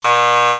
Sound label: buzzer